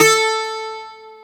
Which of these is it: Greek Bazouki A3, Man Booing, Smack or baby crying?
Greek Bazouki A3